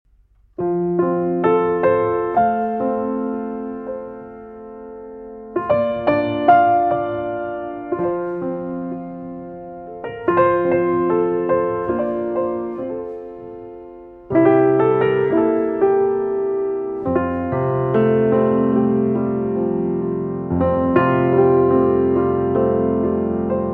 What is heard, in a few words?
Camptown Races (Instrumental Version)